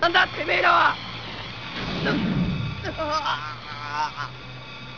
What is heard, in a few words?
pain, japanese